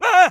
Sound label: fear